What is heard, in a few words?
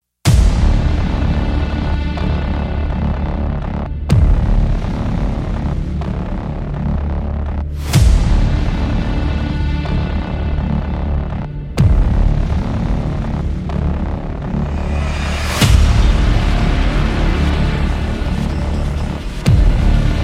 Trailer Music; Colossal